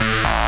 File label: saw lead synth